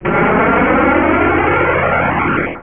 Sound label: Fly By; Bedlam